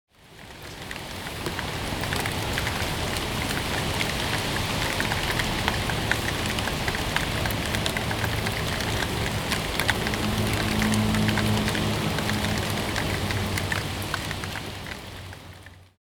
rain

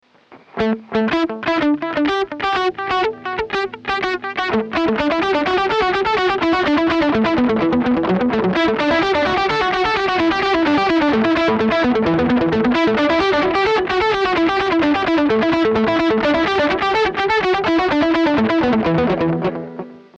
copy delay

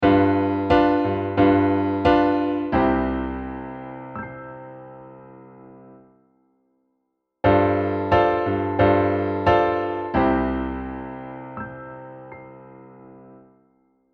iim7 on V

